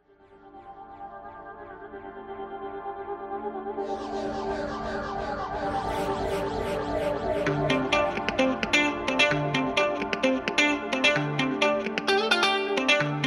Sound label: Guitar club mix